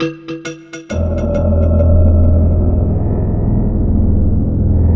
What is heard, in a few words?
Korg, FX